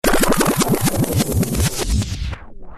SPIN BK, VINYL

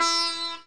sitar

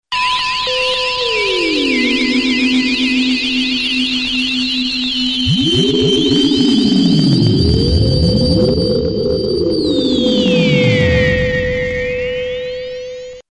SCI FI 60S, SYNTHS

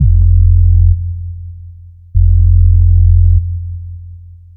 smooth

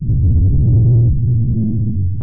d bass dist fucked

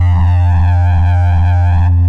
sine ting

